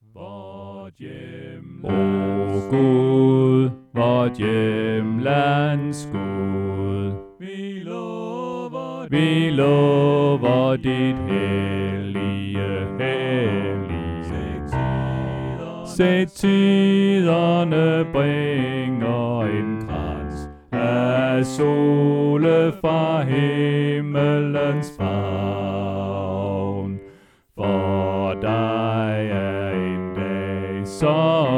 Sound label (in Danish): Bas; oevefiler